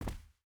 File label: footstep barefoot cloth jump land; Rust